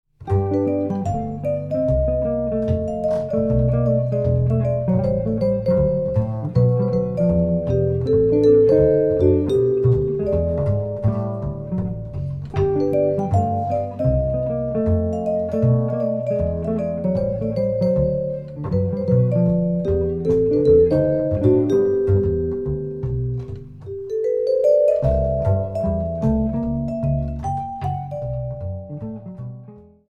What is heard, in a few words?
vibraphone